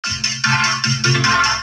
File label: Chord Vocoder 150BPM